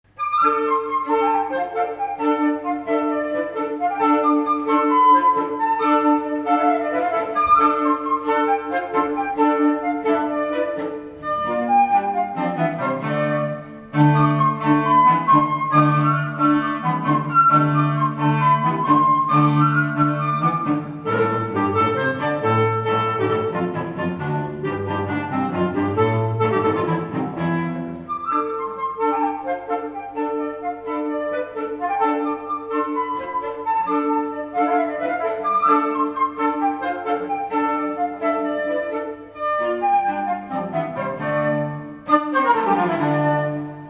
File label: Tamburin; H.Tomasi - Concert Champetre